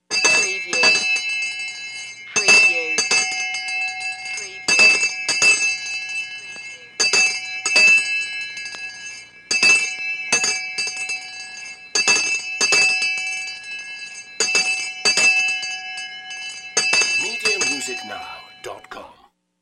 School Bell Old Fast

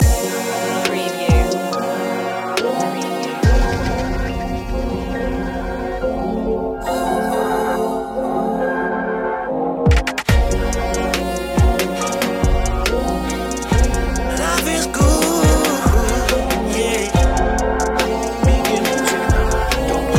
smooth soul vibes loop

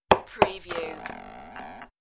Christmas bauble dropped on a table; beanstalk audio

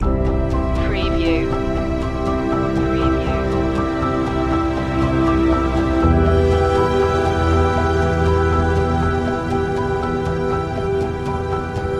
flowing emotions loop

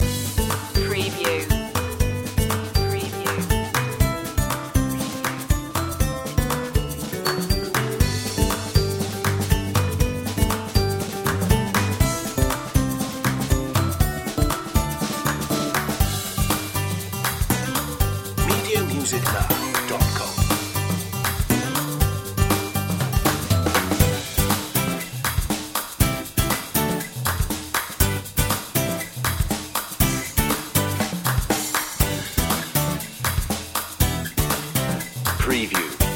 another way loop

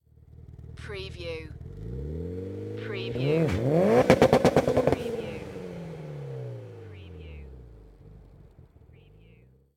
MITSUBISHI EVOLOUTION EXHAUST TAKEOFF

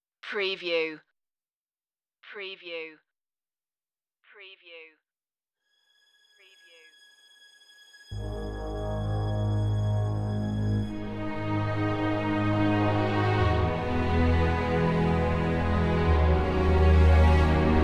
a light down below no staccatos no perc

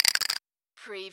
CARTOON CHATTER

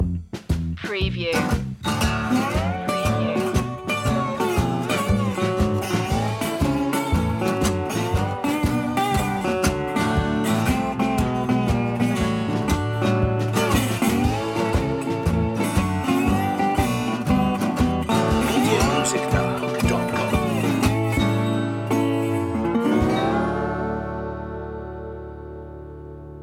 blues

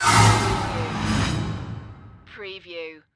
Whoosh; Sound Effects Bible

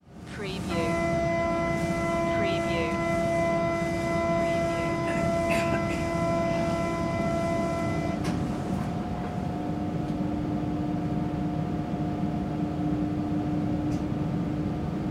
Metro Train Interior

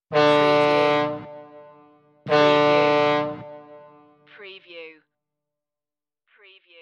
Fog Horn

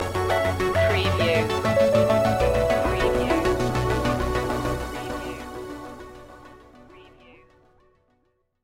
forward thinking stinger

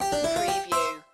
Casino Slot Machine Win Fanfare

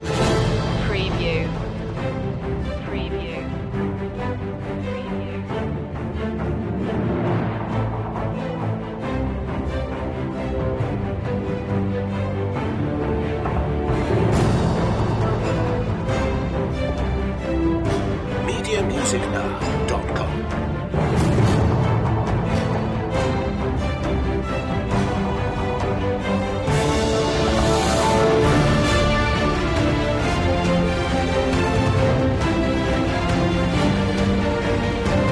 no choir

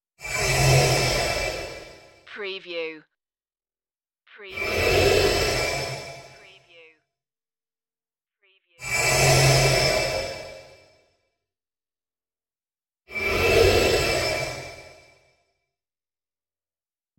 deep robot breath

